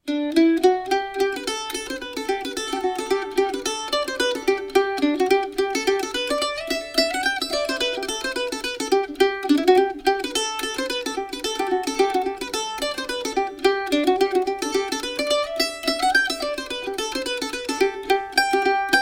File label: Ellis F5 Mandolin; se electronics gemini ii